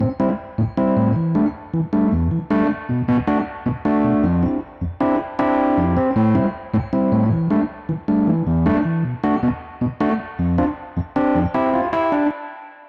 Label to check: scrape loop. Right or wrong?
Wrong